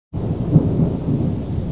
storm; citystorm

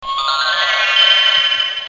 magic jingle; Mark Jacobs

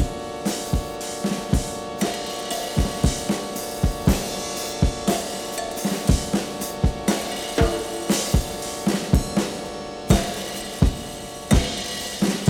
beat

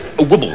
WUBBLE

